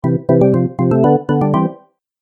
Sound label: Organ Game Music; Cartoon Music Sound Effects